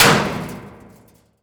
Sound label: bullet metal barrel; physics